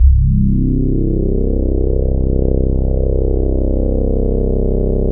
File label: JX8P bassics C1, vintage keyboards; Big Fish Audio - First Call Vintage Keyboards